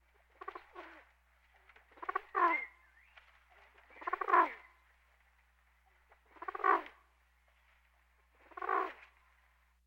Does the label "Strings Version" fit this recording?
No